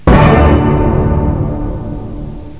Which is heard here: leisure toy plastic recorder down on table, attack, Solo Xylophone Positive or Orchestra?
Orchestra